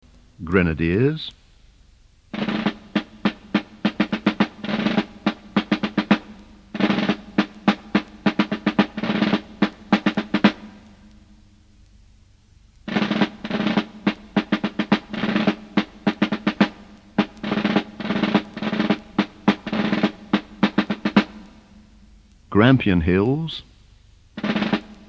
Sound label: Drum solos